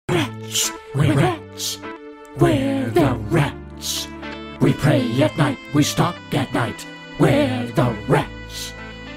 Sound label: rats